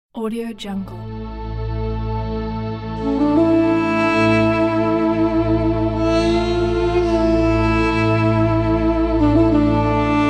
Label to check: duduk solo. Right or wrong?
Right